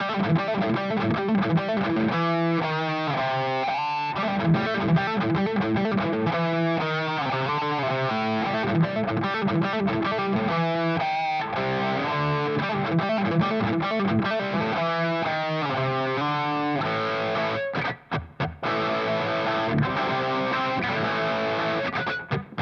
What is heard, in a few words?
Boss GT-1000 Core Distortion Boss OD-1